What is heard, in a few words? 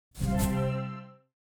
Item Added To Cart; Blutag; Dreamr